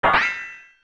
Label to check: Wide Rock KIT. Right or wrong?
Wrong